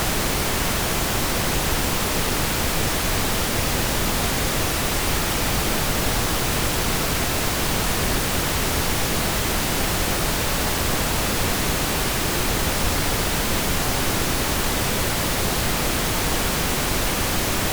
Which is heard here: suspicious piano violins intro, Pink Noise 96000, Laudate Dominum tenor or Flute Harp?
Pink Noise 96000